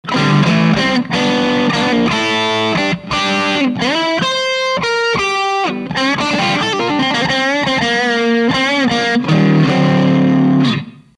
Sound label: 353, BOOST, LF353N; FET入力の定番品